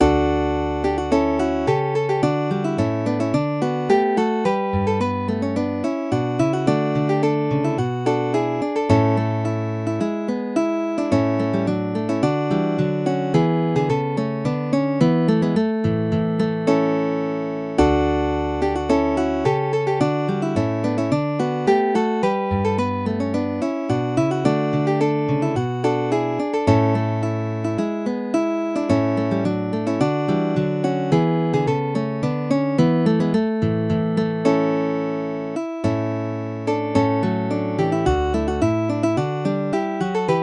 two guitars alman; lawes

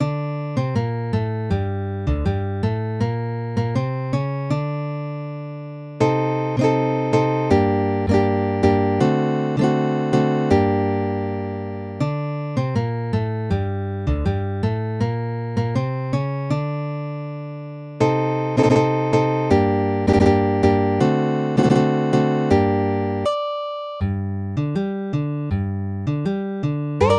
tango, guitar; tarrega

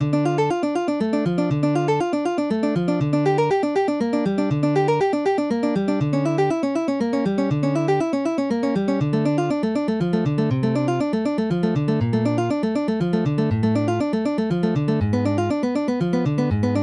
bach prelude for lute, guitar

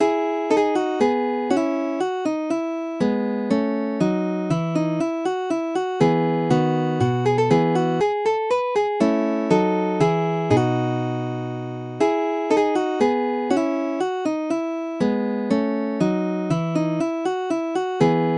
lute suite minuet; bach

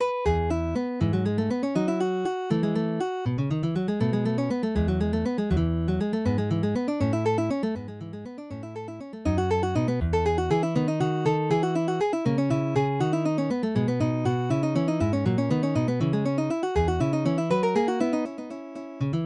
lute suite gigue; bach

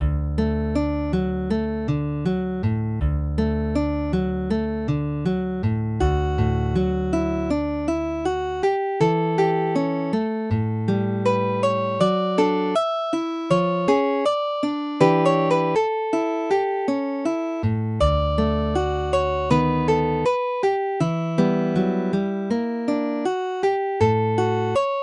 traditional spanish, guitar; canco del lladre

